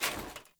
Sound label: Foley Action Armour Run; Characters; Player; Deora Armour